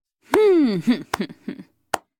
Applaud Night Witch

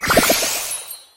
dog throw

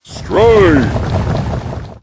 bowl atk

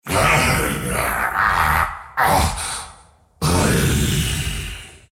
death

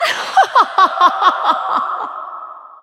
laugh; Lissandra

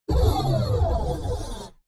determined beep; 堡垒（守望先锋2）/局内触发; 赛前等待

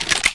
Bow reload

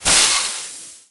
blowdart hit

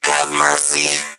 die vo

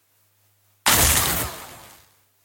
High Five

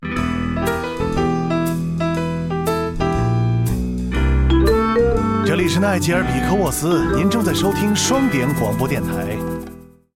Mandarin sting; 双点校园; 奈杰尔（双点校园）; 开场白

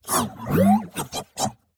annoyed beeps; 堡垒（守望先锋2）/交流信号

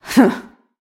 laugh

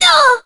hurt

